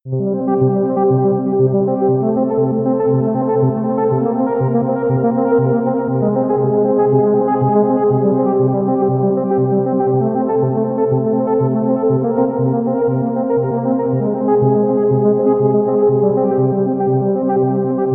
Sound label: arpeggiations, chiptunes